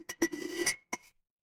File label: glass ceramic